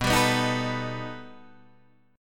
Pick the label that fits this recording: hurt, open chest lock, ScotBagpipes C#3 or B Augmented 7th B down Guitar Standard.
B Augmented 7th B down Guitar Standard